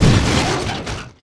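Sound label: stalk stalker train extra bump; Half Life 2; Episode 1; citadel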